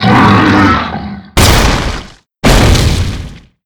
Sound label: Big Yeti Die; Seer; GamigoNA